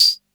CR78 TAMB; Roland CR-78; Roland CR-78 Ableton Project

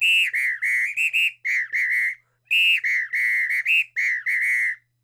96, Percussionworks loops; Sampleheads; GJ LOOPS 96

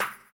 SD2, Dance Mega Drums; Best Service

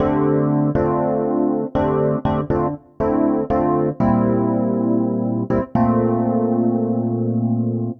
Chord El Piano Dm, 120bpm; Zero-G; Extra Chord Progs

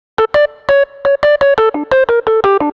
Rhodes Solo, Cinematic Funk; Sony Creative Software; Urban Tone Poems

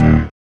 SYN, dance synth; Sound & Vision - Gigapack I CD 2

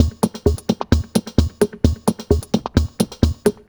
Drum Loop, Drum Loops

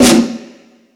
Percussion FX, Drum Machines

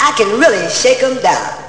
shake; Amiga Soundtracker Sample Packs

